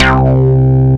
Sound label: FX Bass